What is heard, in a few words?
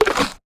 bite low; CrossCode; battle